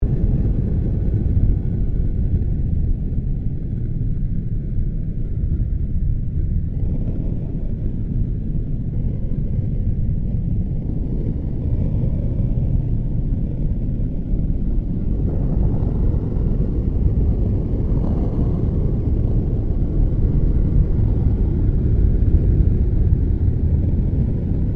Created Ambience The Core, Various ambience created through sound manipulation; Artificial; Various ambience created through sound manipulation in FMOD